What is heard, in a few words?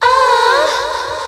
ah orgasm; ooh ahh